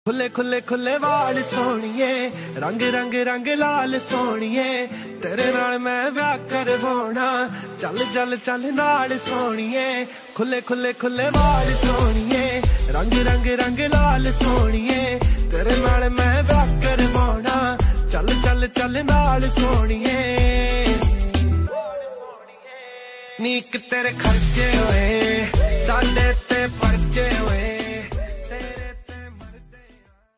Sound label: DJ Punjabi Ringtone